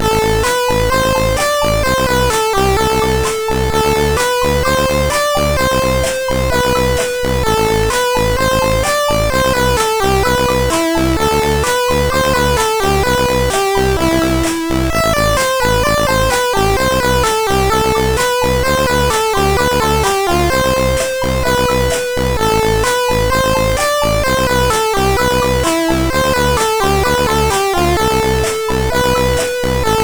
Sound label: Emotional, CSG8580R5